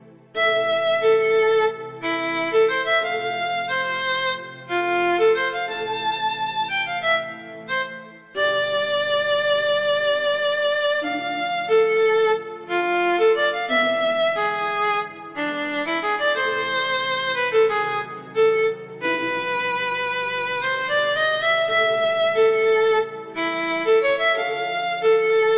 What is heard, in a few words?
Strings, Violin Bowed; Synthphonica; Syntheway